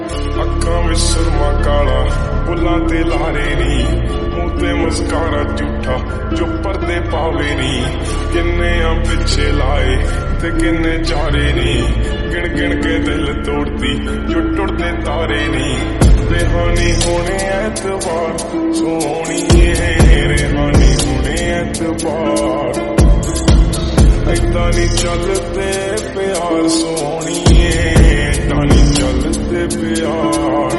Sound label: lofi ringtone